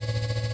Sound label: borg walk, footsteps; Effects